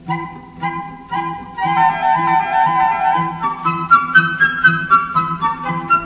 silly flute; ORCHESTRAL and CLASSICAL

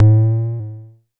Jomox MBase, drum machine; Jomox MBase 01

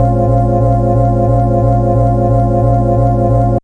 classic bad loop jungle; Pads

